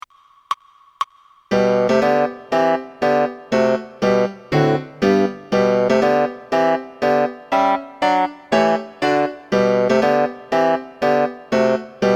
marche des soldats 120, basson

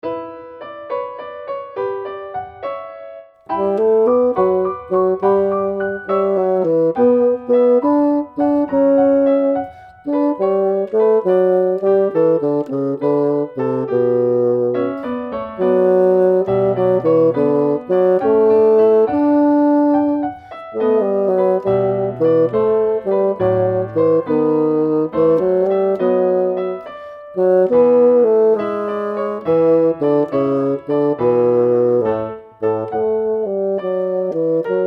tarentelle fantasque basson

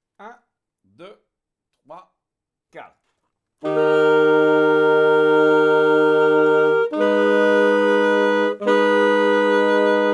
marche, saxophone

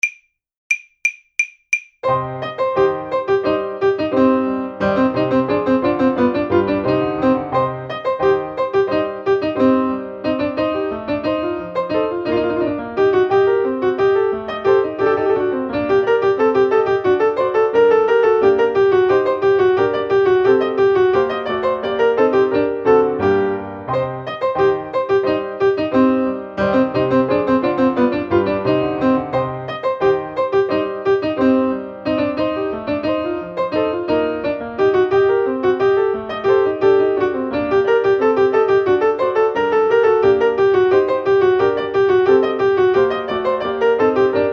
badinerie chant piano, saxophone

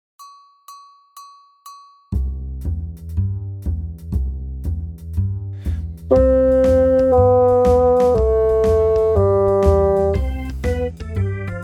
basson

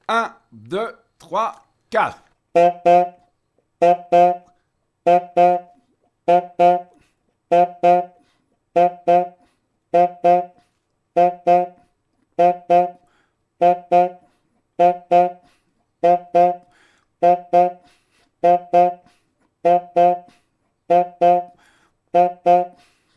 baby elephant basson

